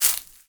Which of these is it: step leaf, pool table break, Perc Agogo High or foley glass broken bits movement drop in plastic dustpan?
step leaf